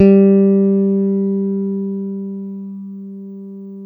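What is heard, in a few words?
jazz guitar; Univers Sons; Jazzistic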